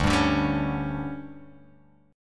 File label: D Major 7th Suspended 4th, chord